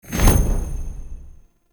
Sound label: Sweetener Heavy Attack Stun; Weapon Impacts; Player; Sweeteners